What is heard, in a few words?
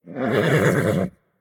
mvt pet vocal; smite; lancelot